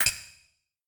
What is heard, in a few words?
social online; tetrio